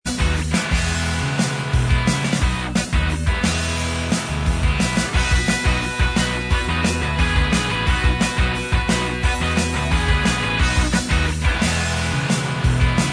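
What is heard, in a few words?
instrumental, backing tracks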